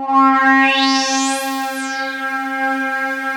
CHROME SWEEP, ARP; OLD SYNTHS; Rubber Chicken Software Sample Bank

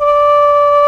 SHIAO D3

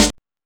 Techno C; EMU XL-7

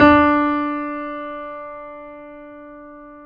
BOSENDORF

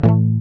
GUI A, Acoustic, Roland

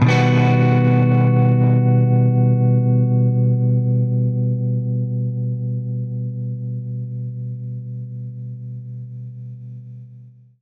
Baritone Chord Emin9[maj7], Guitar Chords; undercover samples

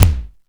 bass, AKAI XE-8; Greytsounds

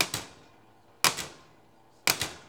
TESLA, Science Fiction

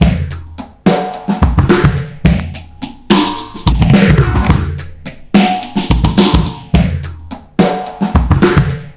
DRM, Breakbeat; FAZED ACT 107

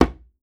djembe; Voice Crystal - Steve Reid Definitive Percussion

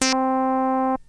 Synth hits and riffs

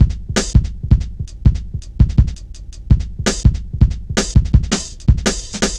Drum Loops, Jungle

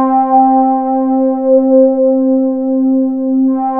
JUP 8 C5, JUPITER 8, Rare Synthesizer; Hollywood Edge - Giorgio Moroder Rare Synthesizer Collection

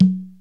CONG LO; Crypton - DrumBase5000

